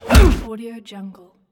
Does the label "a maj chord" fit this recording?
No